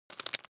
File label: bone break small